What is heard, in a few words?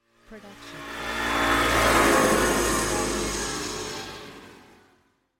slow fly by